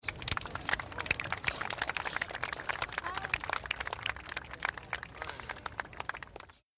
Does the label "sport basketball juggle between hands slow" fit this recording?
No